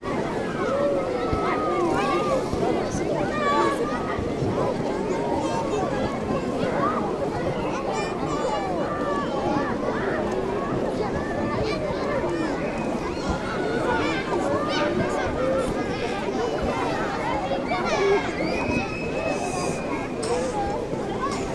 ambience beach lake people swim chatter children play; sound effects one